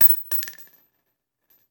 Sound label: Bullet Shell; Sound Ideas; Guns